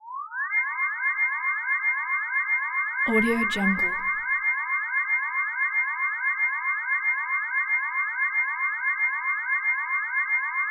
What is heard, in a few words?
super power psychic